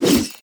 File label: ultimate attack impact; Idle Champions of the Forgotten Realms